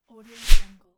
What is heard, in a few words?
whoosh branch swing